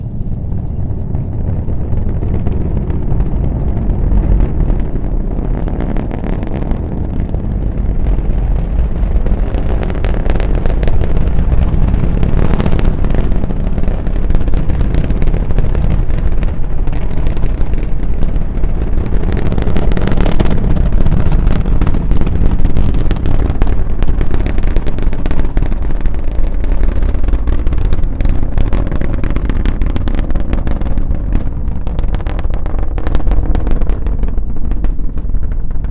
Rocket Launch; Redmatch 2

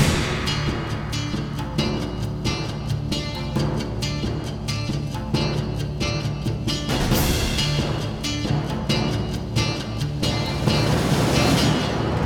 Drums Bass Submix; Cye 05 Announcement Trailer; crush your enemies

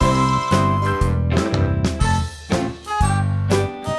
strolling music

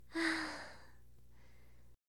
Massage; SFX Bathroom; Hilzartov; Fairy Size Doesn't Matter

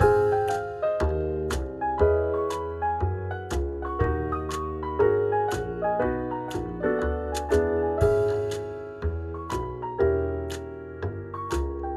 Lobby Loop Speaker; Good Job

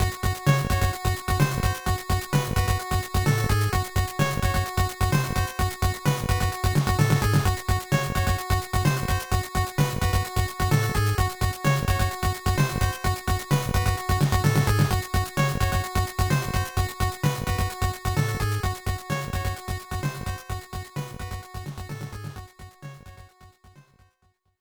Stage Clear, NES; Stage Clear Part 1

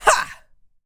teleport; sora

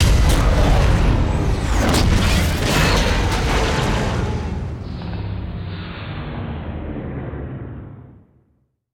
Race Music Lose; Racing; lego universe macos windows gamerip 2010

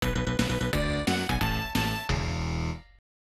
Lose; super bomberman panic bomber w; snes

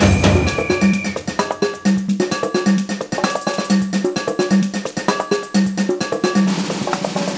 Samba Burst Activation Loop

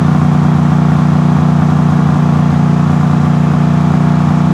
Chevy Silverado Low

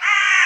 Crow; dungeon marathon

